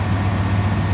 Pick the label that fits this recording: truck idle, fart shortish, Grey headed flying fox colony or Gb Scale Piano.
truck idle